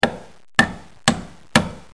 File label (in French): Marteau